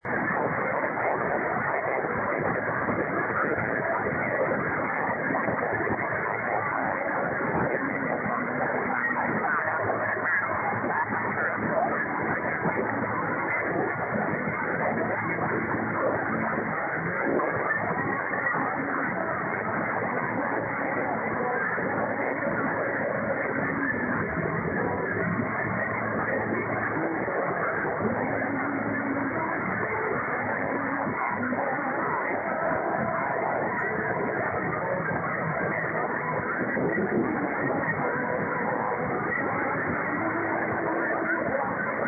1386.06 Euskadi Irratia, Bilbao Spain music noise reduced